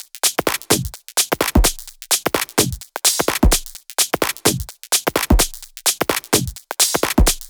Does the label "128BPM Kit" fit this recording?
Yes